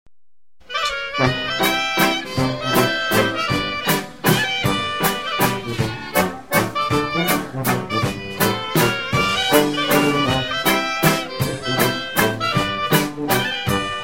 Waltz

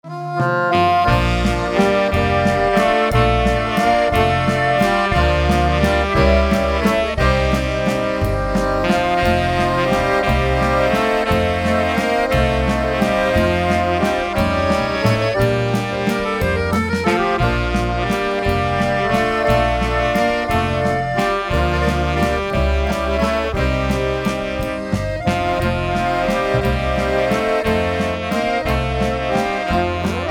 European Waltz

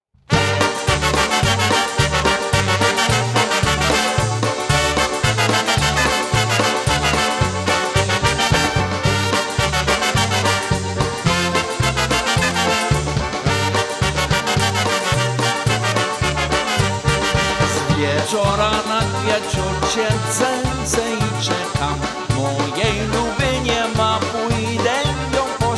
Poison Ivy Polka

